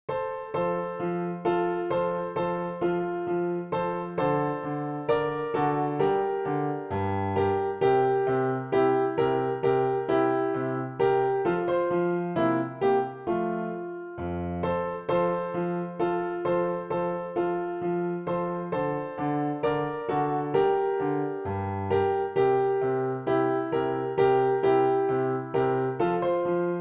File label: Dance PianoTeq Bechstein